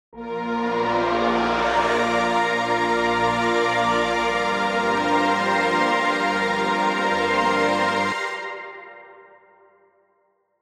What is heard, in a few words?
shimmer pad synth; anise glide reverb; audio spices